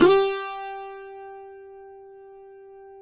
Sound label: CUATRO; Spectrasonics; Hans Zimmer Guitars VOL-2